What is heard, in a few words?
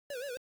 BOMB JUMP; WarioWare Inc